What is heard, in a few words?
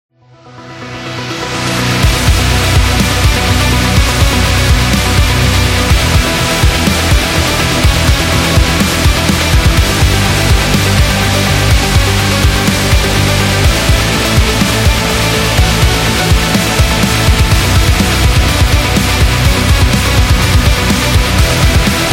Gothic Metal